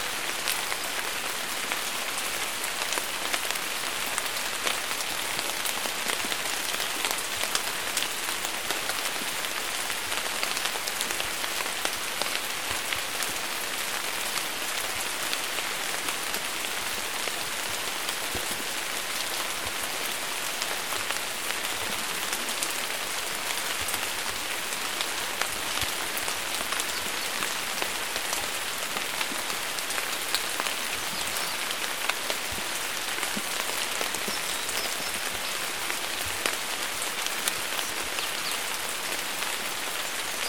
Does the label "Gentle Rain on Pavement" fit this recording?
Yes